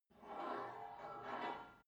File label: take off weight barbell reverby, fitnessstudio